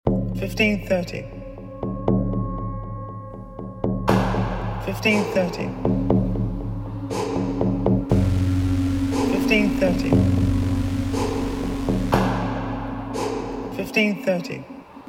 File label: Gong 120bpm